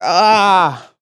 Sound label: "ah"